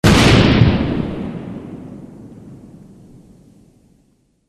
synth rifle

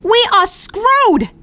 scout negative vocalization, fem scout

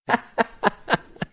laugh; hl2dm

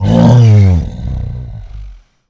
melee; agrunt

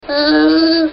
bleeeat

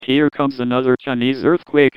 battle cry; John Madden

